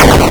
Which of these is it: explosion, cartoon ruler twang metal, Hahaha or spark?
explosion